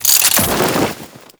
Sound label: Turret Throw; Warframe; Corpus; Corpus Railjack; Crp Admiral Agent